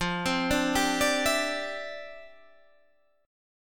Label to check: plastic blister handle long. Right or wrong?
Wrong